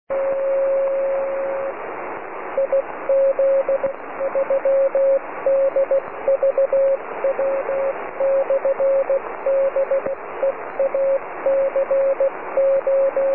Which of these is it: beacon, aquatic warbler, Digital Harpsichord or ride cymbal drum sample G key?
beacon